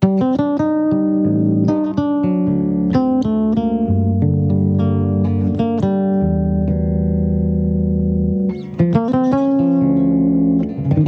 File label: bass folk song